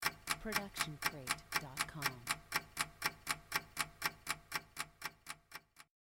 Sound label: tick tock